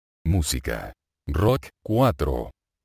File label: rock